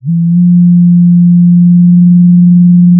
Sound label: pop, Sound of a cruise ship horn